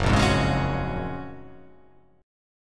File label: F sharp Major 9th